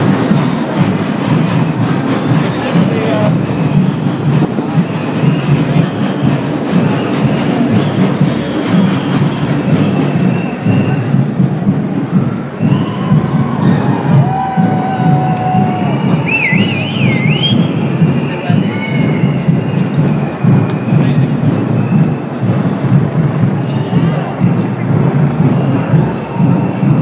few thousand protesters heading down Regent St with bongo music